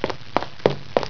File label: WALK FAST; MOVEMENT